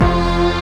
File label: SYN TV, Dance Synth; Sound & Vision - Gigapack I CD 2 (Roland)